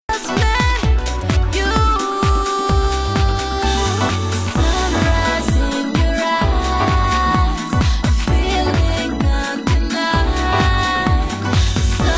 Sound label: alto